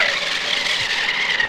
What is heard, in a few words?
screech; Crash Bang Boom